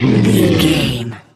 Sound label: troll monster battle groan